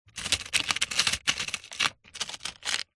industrial screws nails in plastic tool box tray rummage